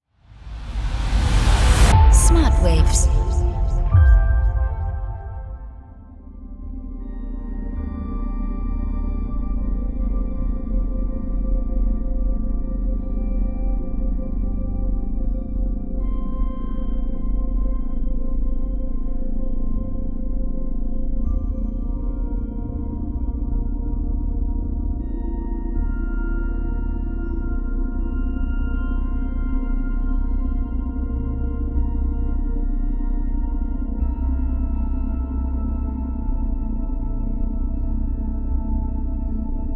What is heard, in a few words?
light mood